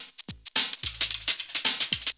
Still Funky; Joepie

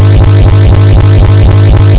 recharge; EMP; Music

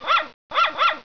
BARK; davidson

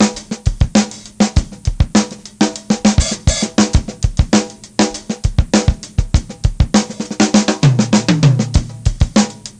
funk

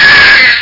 AHH!

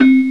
Celesta (C3); X-Wing Patches

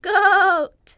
goat; The Interactive Alphabet

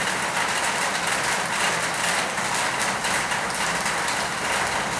rain tin; The Sum of All Fears

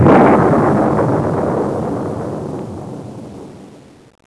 Lightning Bolt; Death Blade

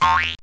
Bounce; Bible Fun Pack; NOAH

